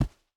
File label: Step Enemy; Game rip; MiSide